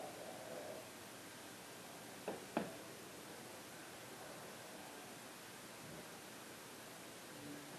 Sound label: Knock; everyones entitled to one good scare